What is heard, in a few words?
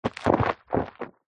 household bowl foamy bubbly water swish hand movements